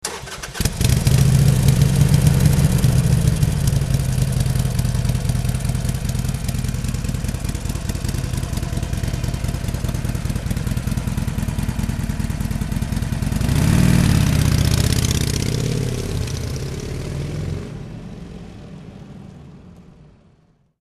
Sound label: harley davidson start engine